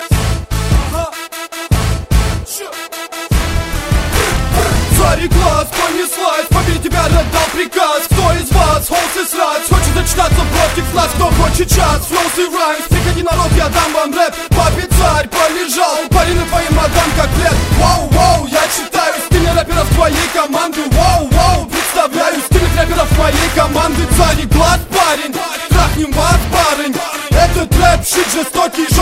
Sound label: Ringtones; Samsung